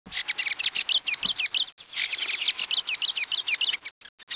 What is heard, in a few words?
fantail